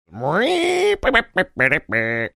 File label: making kazoo sounds